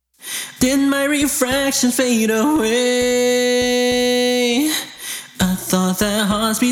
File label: Vocals